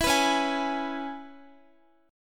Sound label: C sharp Minor 7th C sharp Guitar; C#m7; strummed; x,x,14,16,13,15